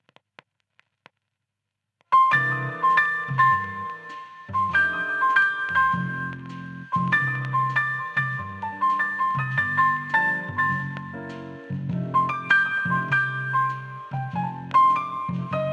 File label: Jazz (Mono); Tom Clancy's Rainbow Six Siege